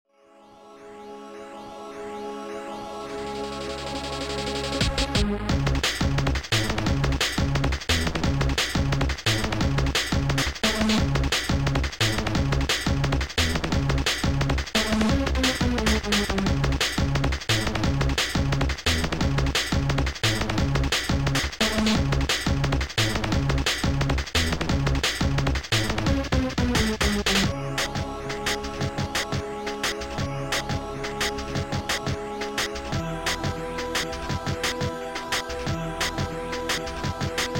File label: drunken bass, oldskool music; mod; assembly2004